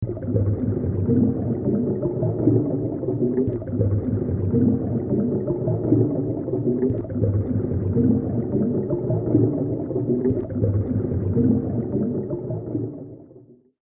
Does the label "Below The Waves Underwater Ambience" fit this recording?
Yes